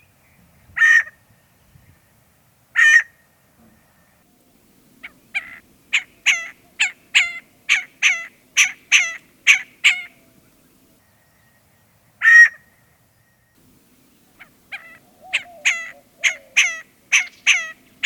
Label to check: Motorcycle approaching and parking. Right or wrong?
Wrong